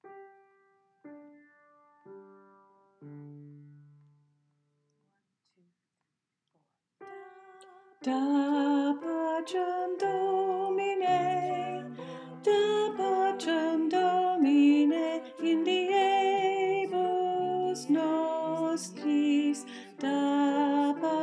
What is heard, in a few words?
Alto, choir